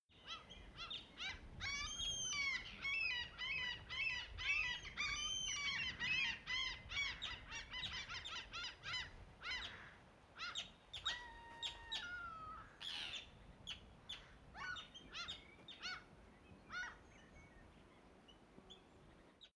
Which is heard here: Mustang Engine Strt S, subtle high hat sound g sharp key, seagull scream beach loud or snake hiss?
seagull scream beach loud